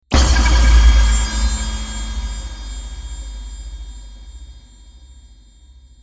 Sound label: Magic Surprise; rpg